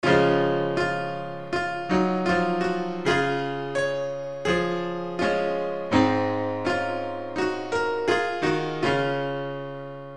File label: savior like a shepherd lead us, instrumental hymns